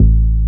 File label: DANCE, Synth Bass; SYNTH-Basses; Sound & Vision; Gigapack I CD 2